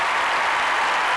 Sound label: CROWD LOOP